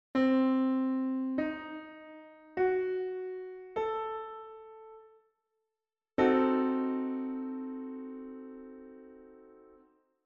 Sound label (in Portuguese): acorde Cm5 7